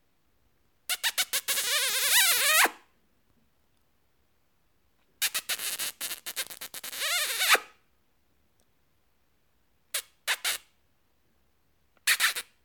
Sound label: Lips Kiss